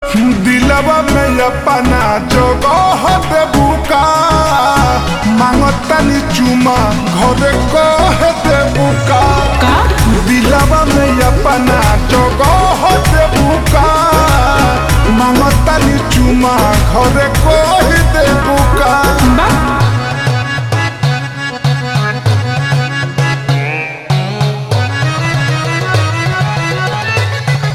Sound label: Ringtone Slowed Reverb